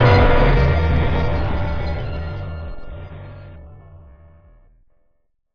Tech, Weird Side Samples

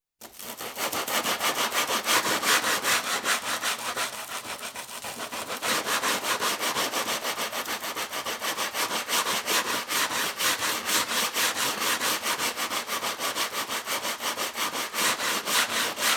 Sanding, Edge Of Plywood, Construction; BBC Sound Effects Library

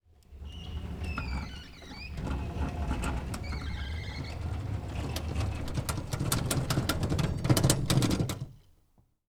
Shutter Door; 99Sounds; The Warehouse

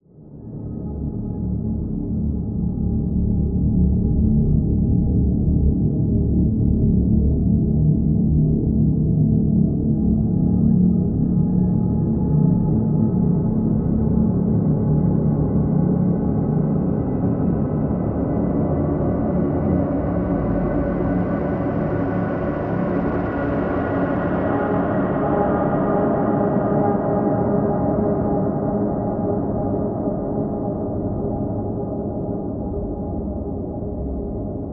Dark Ambience Drone; Sanctuary Of Death; Systematic Sound; Tonal Elements Atrox – Horror & Tension Elements

